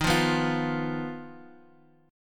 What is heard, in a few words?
Eb Suspended 2nd Sharp 5th, Guitar, Half Step Down; chord